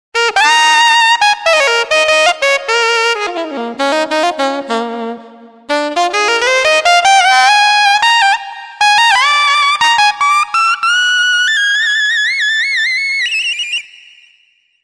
NY Alto; TURBO VL

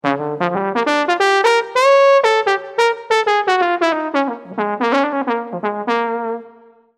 Valve Tbn; TURBO VL

